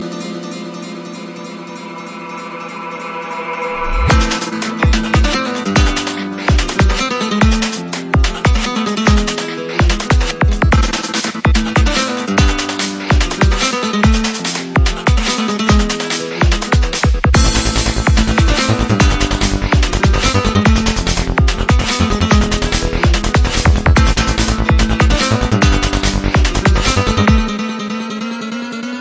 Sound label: flamenco